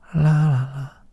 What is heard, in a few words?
lalala